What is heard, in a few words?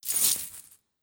Upgrade